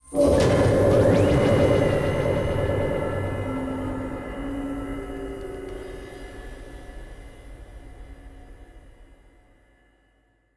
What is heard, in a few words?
Gameover; We Happy Few